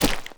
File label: step; block